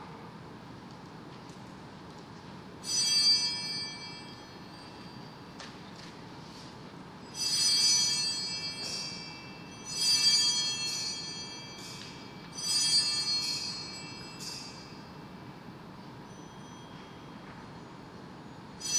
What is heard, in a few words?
bells